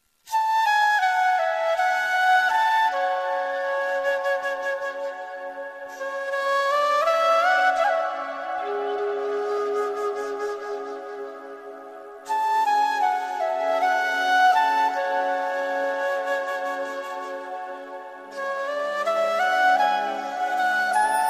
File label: slow tone; ringtones